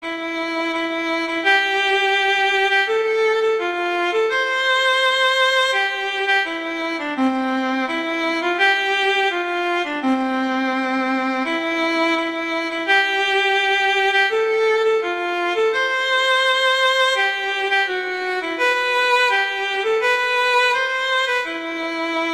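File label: O Holy Night, Viola; Christmas Beginners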